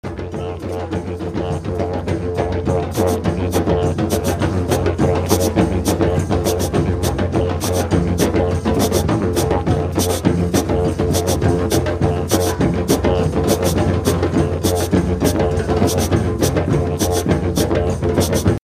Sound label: Didge; drum circle